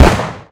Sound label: heavy ammo explode; Weapons; EK modern; Barotrauma